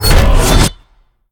activate; Smite; god Lancelot

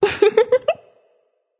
laugh; smite; aphrodite

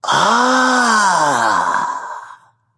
nv discover ah; smite; voicepack; ganesha

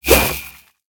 whoosh; smite; raijin

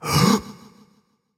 breath; smite; zeus

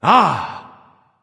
"ah", discover; smite; voicepack; ullr

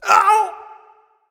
grunt hit; camazotz

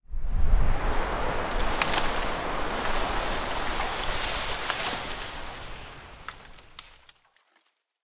ambience foliage; smite; conquest

